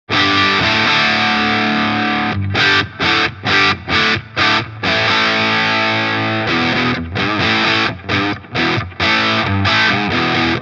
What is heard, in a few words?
Earthquaker Devices White Light V2 Gain 12h Weight max Comp